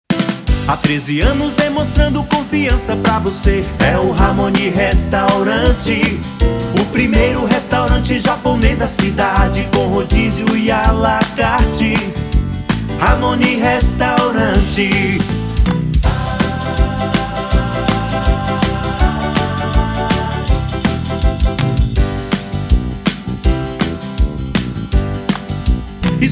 jingle restaurante

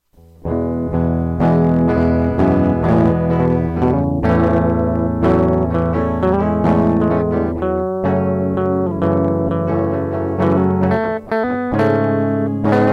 solo de guitarra base

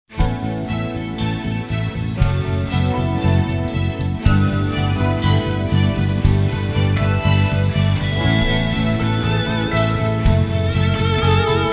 instrumental, louvor; Novo Louvor

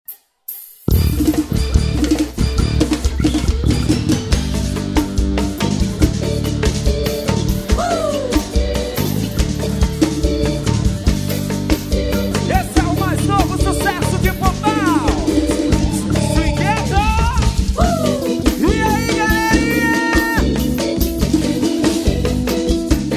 abertura instrumental

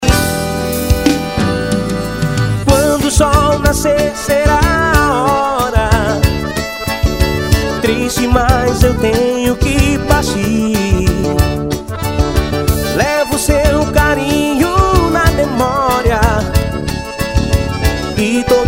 forró ao vivo

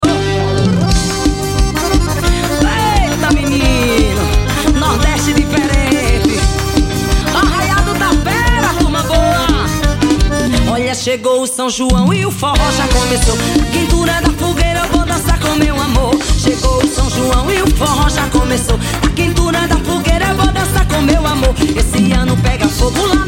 forro, arrasta pe; patio do forro

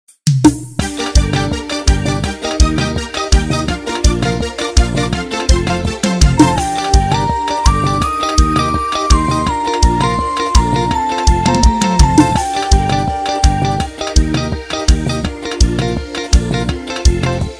eletrico playback karaoke

